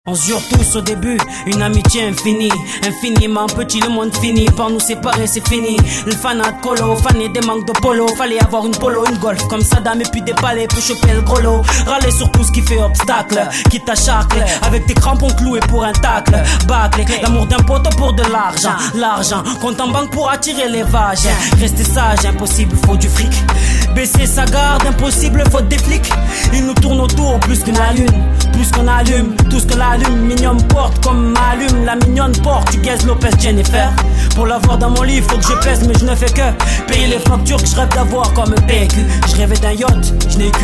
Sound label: Soprano